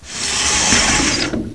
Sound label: scrape, ambience; caged